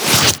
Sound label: Dash